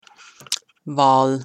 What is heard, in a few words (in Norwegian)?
Hval